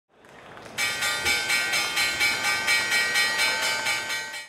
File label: stock market bell